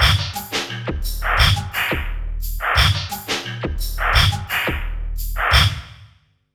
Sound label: 87 VRAC END 3, Industrial Loops